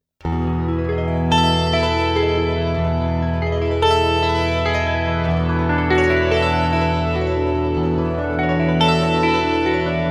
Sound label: Perfect Fifths